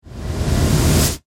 Whoosh Reverse, Suction, Accent, Transition; Production, Element, Imaging; Whoosh Sound Effects; BlastWave

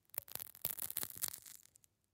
Toothpick Break Slow Soft; Hand Tools; Foley

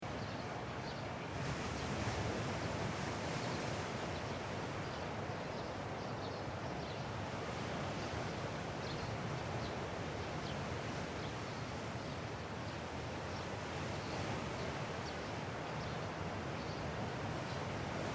Ocean Waves With Birds Loop; Relaxing ocean surf with subtle bird chirps; cut to loop seamlessly; Ocean Sound Effects

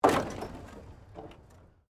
Wood Planks Impact Wood Wire; Materials

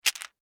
Medicine Bottle Shake Movement Small; Emergency; Pops

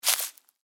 Footstep: Individual Footstep On Dry Leaves; Human; Footsteps

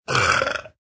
Burp Raspy, Burp, w. FX, (human voice); Burping Sound Effects

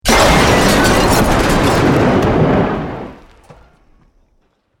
Building Collapse Medium

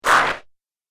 Laser Alien Zap, laser gun

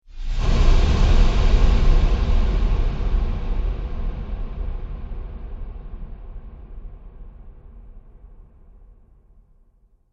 Cinematic Dark Hits; Sound Design; Hit Sound Effects

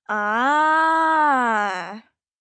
Aahhh, Exclamation, 1 girl, 12 yrs.; Reaction; VoiceBox

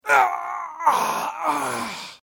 Human Death Male Dying Sigh Grunt; LYN116 package of human sound effects

